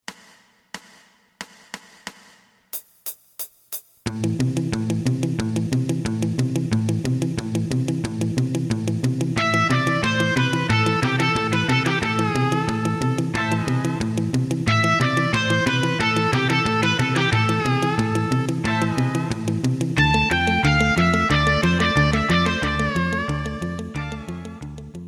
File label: drum